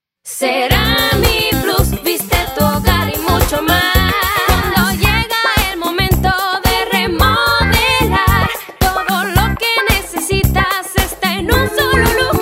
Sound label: jingle; ceramiplus